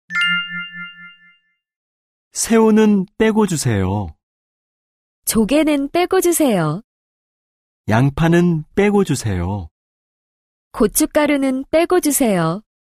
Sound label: K-POP